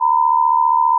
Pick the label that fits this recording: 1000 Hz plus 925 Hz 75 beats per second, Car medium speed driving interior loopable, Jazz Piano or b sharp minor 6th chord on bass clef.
1000 Hz plus 925 Hz 75 beats per second